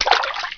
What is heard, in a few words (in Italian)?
Passo in acqua, Quando Lara cammina nell'acqua; Suoni acqua; Il JukeBox di Lara